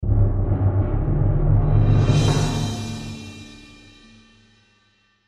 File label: Timpani Roll, Timpani Roll Cymbal Swell; Hit; Blastwave